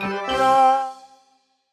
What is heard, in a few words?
Beats Pickup; Sparklite